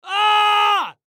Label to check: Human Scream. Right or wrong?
Right